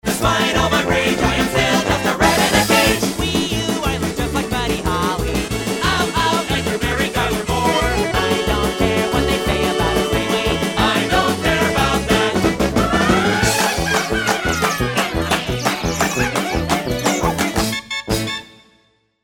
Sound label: Alternative Polka Cut